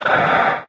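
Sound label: Movement Breath Out; smite; god bakasura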